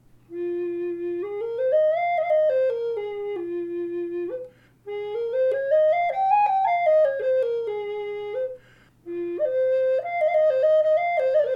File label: F4 Shadow Hawk poplar, Native Style Flutes